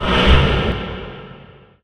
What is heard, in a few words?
nether portal usual; nether-pack